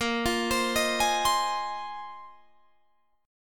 A sharp 9th Suspended 4th A sharp Guitar Drop D

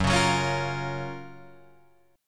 F sharp Major 7th, Guitar, Drop D

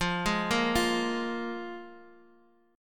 F Minor Double Flat 5th, F, Guitar, Open D; x,x,3,2,1,3; down; chord shape

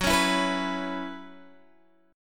Gb 7th Suspended 4th Gb down Guitar Open G, chord

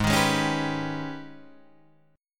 G sharp Minor Major 11th

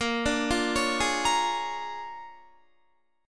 A sharp 7th Sharp 9th A sharp down Guitar; A#7#9 arpeggiated; JGuitar

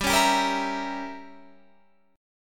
G Diminished 7th, Guitar, Standard; strummed; JGuitar

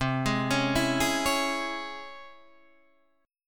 Db Minor Major 7th C

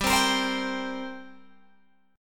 G Minor Double Flat 5th, Guitar, Open G; chordshape; down

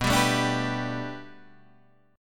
B Minor 7th Sharp 5th B Guitar Drop D, chord

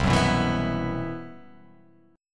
C Major 7th Flat 5th C

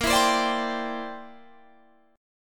C Major Add 9th Bb down Guitar Standard; strummed; Cadd9

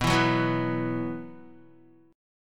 B Suspended 4th, Guitar; Bsus4 strummed

